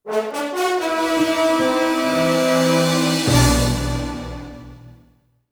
Sting

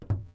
thug fs hMetal, footsteps

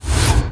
Screen Slide On

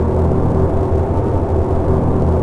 Ambient

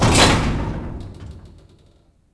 door warehouse end; doors elevators; Star Wars Obi-Wan; XBOX

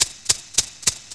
loop, engine; ships; Quantum Redshift; XBOX; zafir

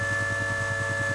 rep canon charge lp; weapons

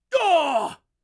Cry HURT; TrueCrimeStreetsOfLA; XBOX

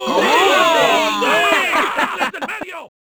foul, Crowd

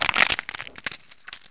Carn tear, Dino

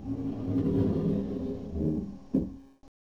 airlock move, doors; movers; Soldier Of Fortune II Double Helix; XBOX

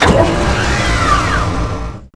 mech arm; Tony Hawk's Project 8; XBOX

